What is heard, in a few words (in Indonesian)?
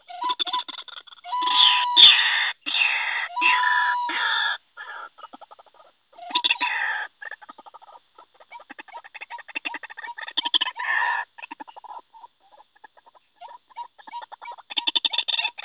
suara ruak ruak pikat